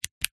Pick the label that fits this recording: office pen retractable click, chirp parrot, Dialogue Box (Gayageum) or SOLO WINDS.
office pen retractable click